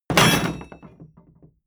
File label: wood impact metal rattle hard